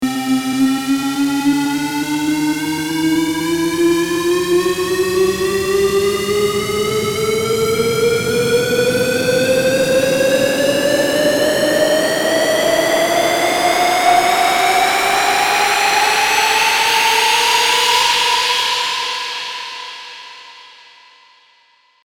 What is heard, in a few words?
build up sound